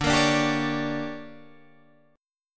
D Major 7th Suspended 4th Sharp 5th, Guitar Open G